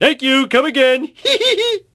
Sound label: heehee